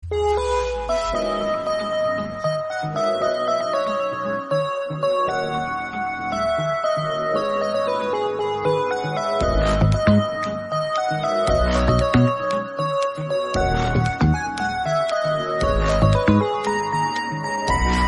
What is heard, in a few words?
Instrumental